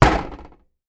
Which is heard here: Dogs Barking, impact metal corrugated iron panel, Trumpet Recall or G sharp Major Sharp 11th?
impact metal corrugated iron panel